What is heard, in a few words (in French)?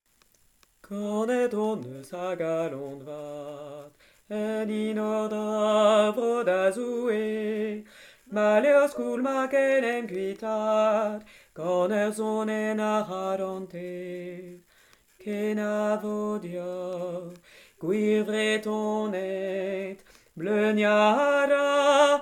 tenori